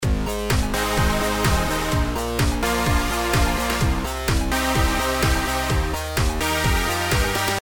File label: hard pad